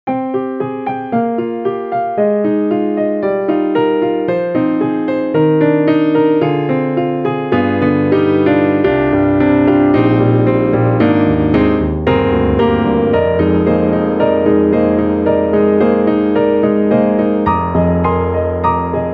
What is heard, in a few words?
Grand Piano; Precisionsound; Amore Grand Piano; free VSTi